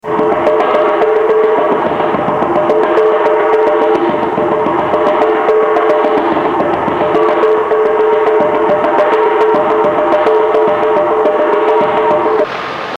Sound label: shrine dictorphone mexico, street recording